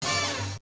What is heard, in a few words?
COIN; dunk mania; namco system 11 arcade